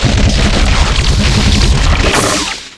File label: teleport skill out; lilith